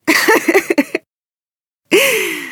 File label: laughter, woman; Stalker Anomaly; human